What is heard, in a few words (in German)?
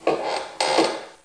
knarren; ottelo